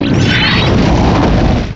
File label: cry; source of the sovereign